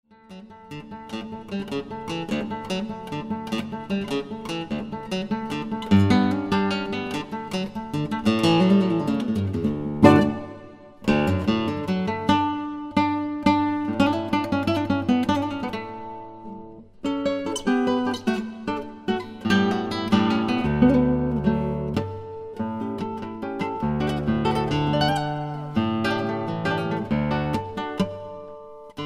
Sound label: Guajira